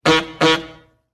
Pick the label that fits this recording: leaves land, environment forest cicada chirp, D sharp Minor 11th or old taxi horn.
old taxi horn